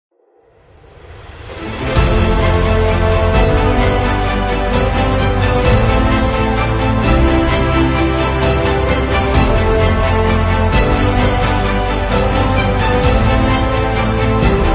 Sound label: Epic Chorus; 音乐素材 史诗般的 Epic